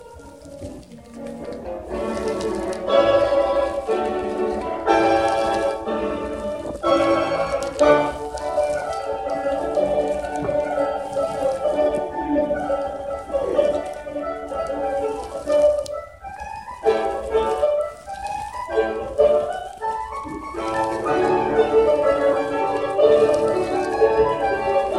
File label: mandolin